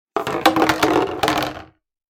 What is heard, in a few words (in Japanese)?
ペットボトルが転がる音